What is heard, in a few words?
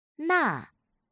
stroke